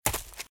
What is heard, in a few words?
Footsteps - Leaves - Run - Left; RadwinIsland